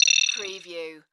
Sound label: Multimedia Alert